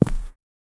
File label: footsteps walking on soli